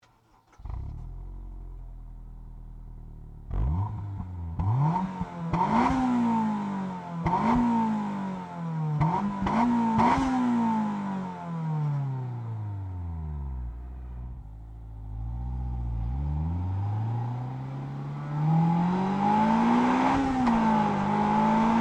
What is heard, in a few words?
Honda S2000